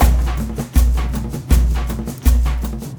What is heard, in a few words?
AF TRANCE; Spectrasonics; Heart of Africa 2